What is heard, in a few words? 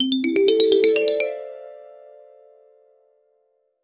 level loose light jingle